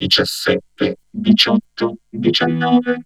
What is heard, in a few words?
Vocode; Choir